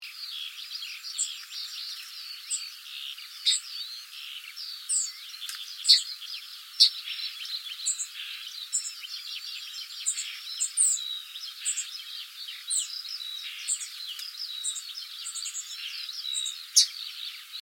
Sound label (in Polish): trznadel inne glosy